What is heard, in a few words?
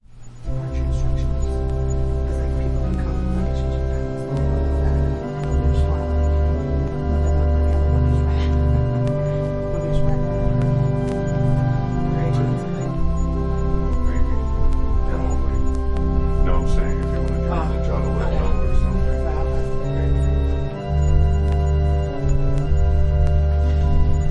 Organ prelude